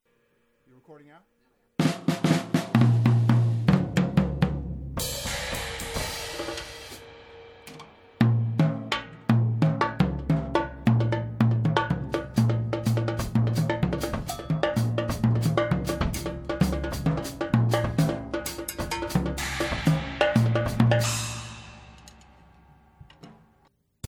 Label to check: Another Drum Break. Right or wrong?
Right